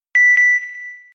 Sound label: Double SMS